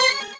normal hit normal

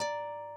harp

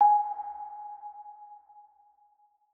Bell

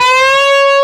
bend full; osu

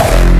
hkick F4

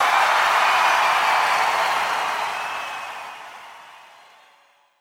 cheer

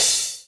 cymbal

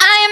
vocal

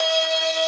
synth main

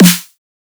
punchy snare

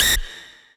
lead

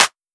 soft hit clap; osu

